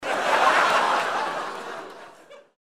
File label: laugh